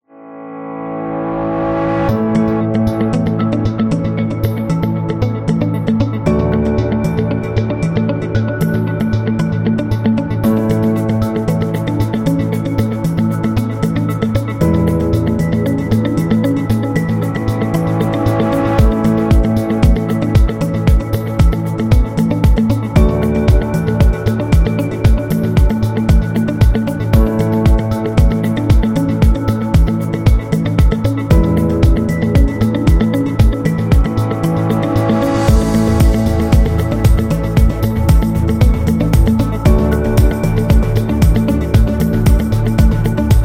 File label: Thinking; corporate music vol 10